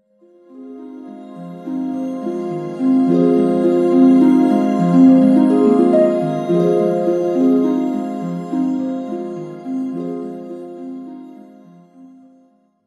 hymn of valor melody; sona

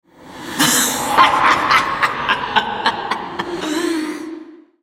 laugh; Syndra; en US

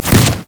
wildfire; LoL; brand zombie

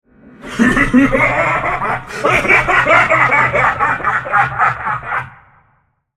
laugh; Xerath; Character Voices - English; en US

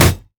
basic attack; LoL

